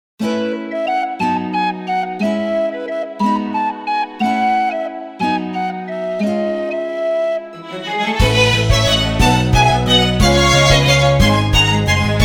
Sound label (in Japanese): offvocal